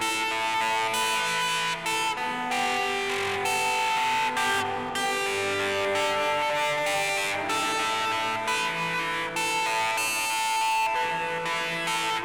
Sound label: guitar